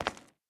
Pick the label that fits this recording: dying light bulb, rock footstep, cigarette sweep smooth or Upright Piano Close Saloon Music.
rock footstep